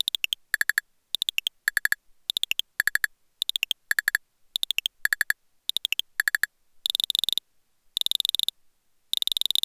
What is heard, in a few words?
Timer; Wii